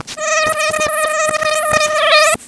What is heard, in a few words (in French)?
moustique